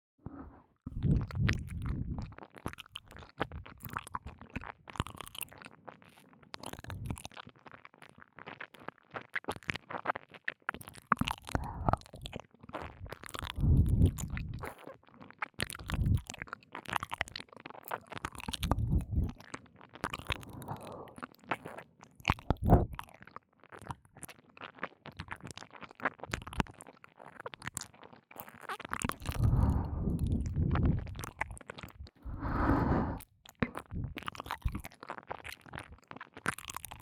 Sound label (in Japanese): ぐちゅぐちゅ耳舐め左耳; 耳舐めコレクション